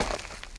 Footsteps Dirt

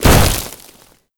Nature Spell Vines Tree Impact; Legend of Keepers (gamerip) (2019)

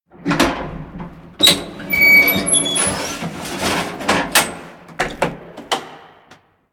Door Metal Gate

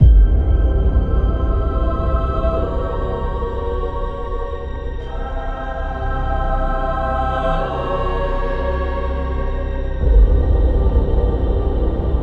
Event Suspense Longfade

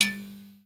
Intro Server Room Light On Blink

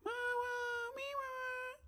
Grub Wave; Grub Wave 01

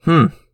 chorus anger; Frogsong

